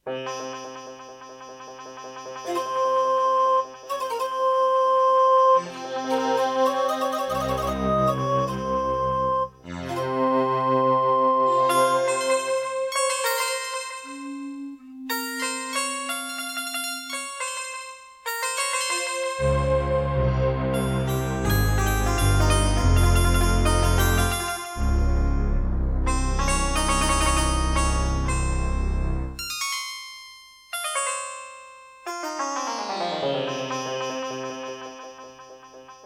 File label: Intro, Serdaco Dreamblaster X2GS NATIVE; Prince of Persia 2 - Intro